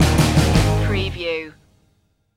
breakin waves stinger

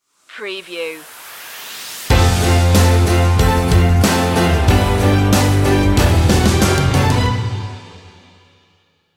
going the distance stinger

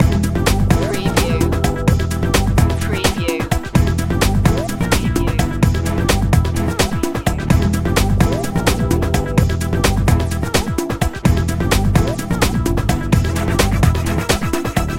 secret agent loop

